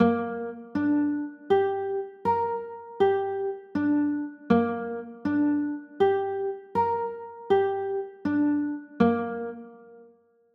b flat 9, chords

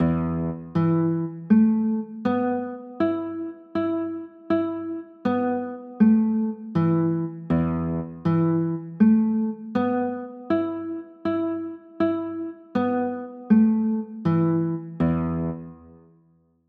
e sus4, chords

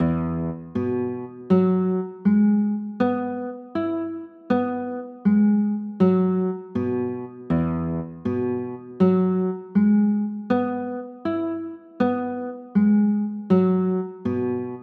e add9

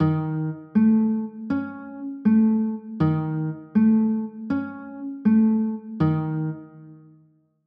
e flat 7 sharp 11, chords

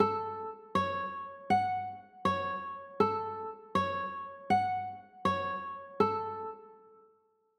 a 6, chords

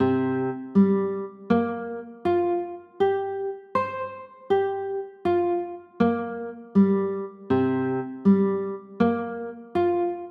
c 7sus4, chords

